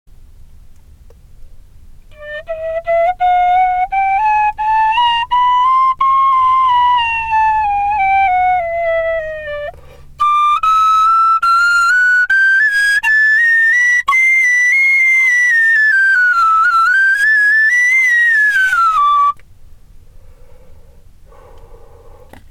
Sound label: hanon asobi, tinwhistle